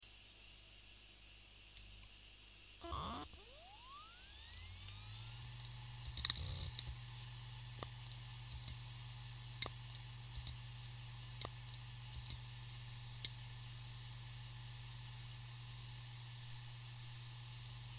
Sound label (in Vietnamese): hdd seagate 7200.12 loi firmware hoac khong dung board